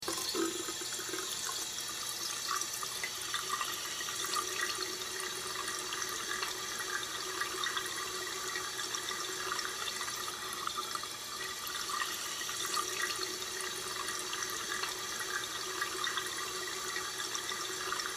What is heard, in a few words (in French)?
Evier qui coule; Blind Survivor